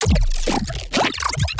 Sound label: Funny Neuro